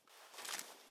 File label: blast melee end